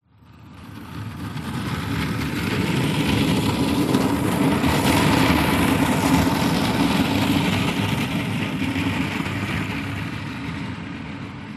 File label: household trash roll out past